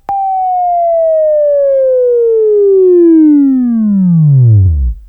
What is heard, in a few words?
WAV Right, Sweeping; Labview Program; Sweeping Training program